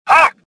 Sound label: anger